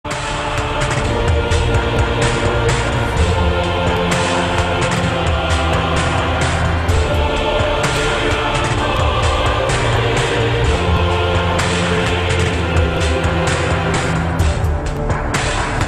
Prematch Music Ringtone; Aston Villa

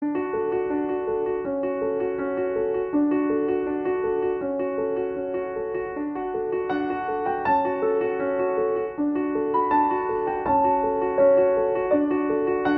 solo piano version

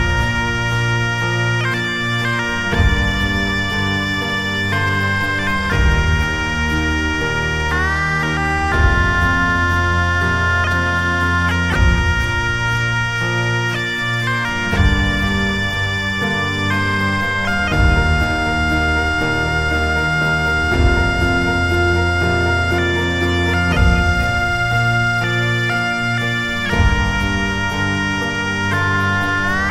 bagpipe

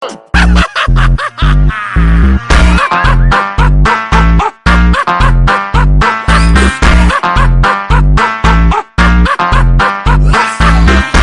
MEME FUNK Ringtone, Super Slowed Song

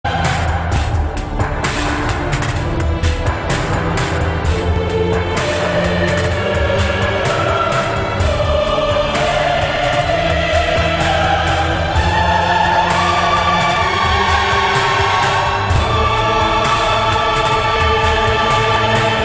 Anthem Ringtone; Aston Villa

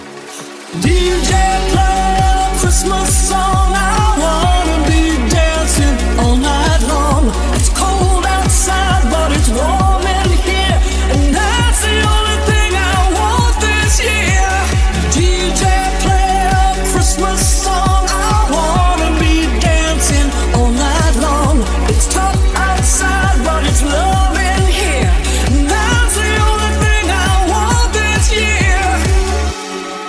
christmas song ringtone

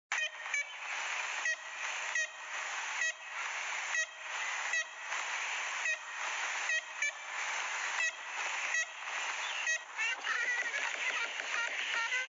ivory billed woodpecker Sound

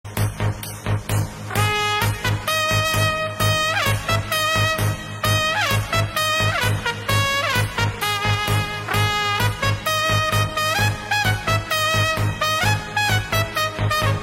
Trumpet Song Ringtone; Edwin Diaz

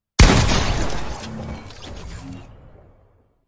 Gold Pump Headshot Sound Effect

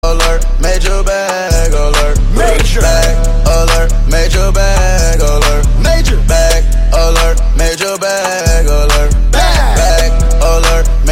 Alert

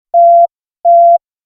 Star Trek Communicator Beep Ringtone

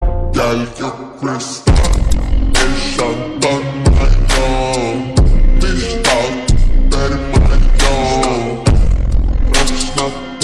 slowed ringtone

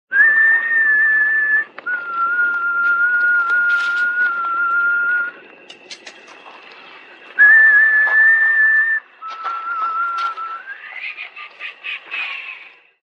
whistle ringtone; negan

